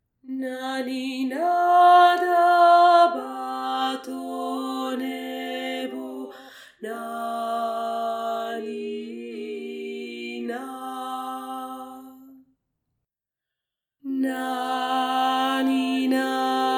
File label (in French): NANINA DA BATONEBO VOIX GRAVE